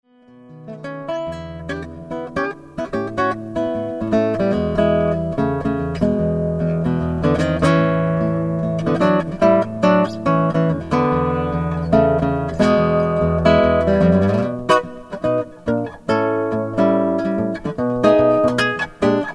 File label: Blues